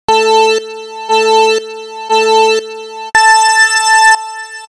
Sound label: countdown; mario kart 8